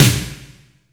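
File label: wet snare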